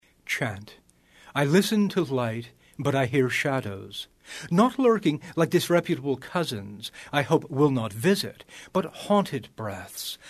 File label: Chant; poetry